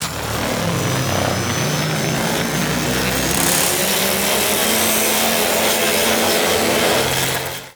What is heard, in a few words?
Fortress Electricity Turret Charge; Ambience; Gameplay; Warframe; Grineer